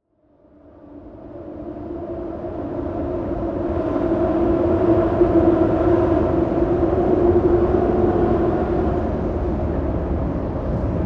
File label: Ponte 25 de abril Lisboa